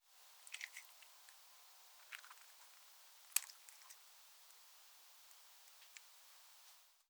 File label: Cat Licking Lips